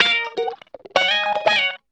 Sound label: 125 d#, guitar licks; house; Vocal House; PS-28 Vocal House Samples; instrumentloops